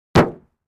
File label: Car Roof Impact